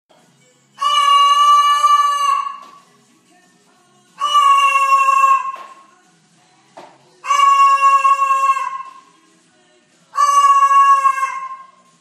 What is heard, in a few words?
Cockatoo